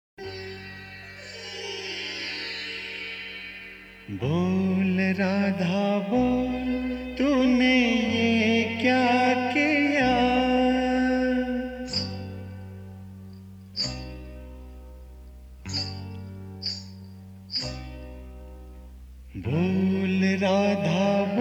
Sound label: Theme Song Mukhda; Bol Radha Bol